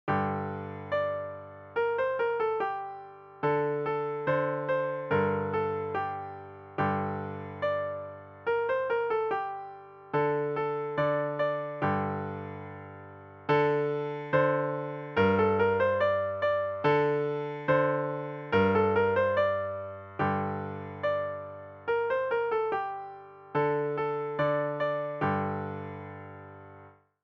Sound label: indian drum piano